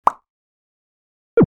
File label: Bio unlock